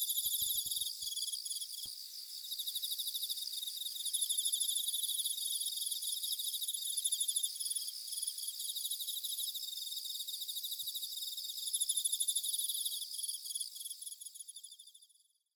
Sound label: insects